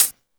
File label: Hat, Yamaha DD-20